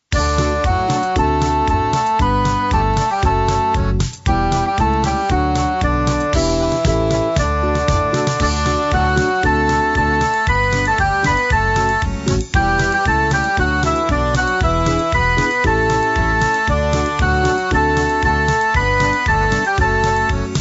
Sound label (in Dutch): karaoke; er op uit